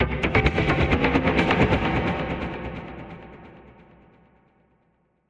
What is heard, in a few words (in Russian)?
barabannaya petlya plemennih barabanov